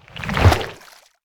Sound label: nessie